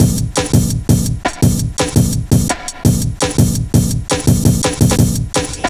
Old School, Jungle, Drum Loops 168.5

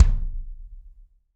BD AMBIENT, Drums; Real Mega Drums

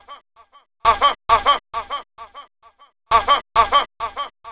Uh Huh Vox; Sonic Foundry (Sony Creative Software); Club Joints and Street Anthems; Lions Den

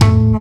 TOM FX, TOMS KIT, Ragga & Dub Reggae

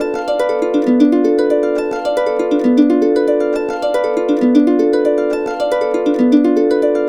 KUBLA D, Complete Harps; USB Soundscan vol.62; AKAI; KUBLA 70

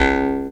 C# JAW, Primitive Instruments; Big Fish Audio - Didgeridoo and Other Primitive Instruments; JAW SIMPLE

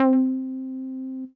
Synth C, Synth Prophet One Shots; Electronisounds

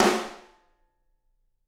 SD, Drums; Bob Clearmountain Drums I

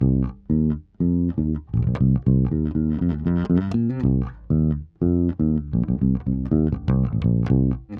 Bass 120bpm Cm, Nu Disco; Zero-G; Construction Kits; Legacy

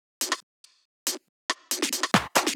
drum Loop 140BPM Perc

